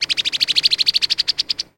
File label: Brown Headed Cowbird